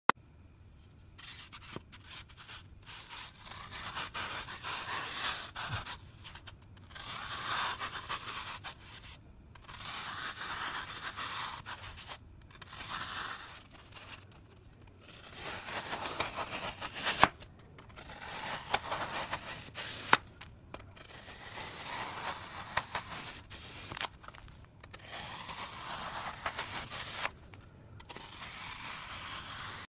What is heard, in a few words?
Flower sponge, صدای برش اسفنج گل